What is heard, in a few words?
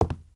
step wood; STALZONE; minecraft